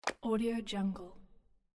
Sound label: rock button